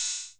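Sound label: hi hat open, Korg KR-55B Drum Machines; 300 Drum Machines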